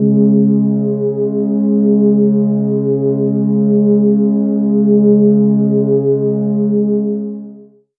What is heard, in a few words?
pad g sharp3